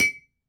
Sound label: Glass hit